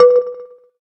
electric, keytone

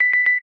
camera timer last; LG P940 Prada 3.0

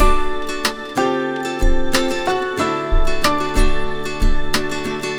Pop, ringtones; Google; NewWave Labs

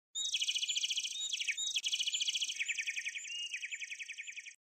sms; Huawei C5589

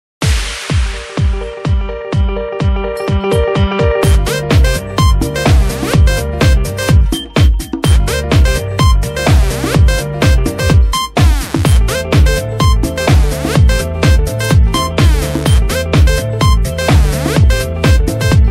Dance on the stage; Samsung Galaxy Chat

